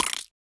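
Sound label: vox percussion, Vocal Planet Jazz FX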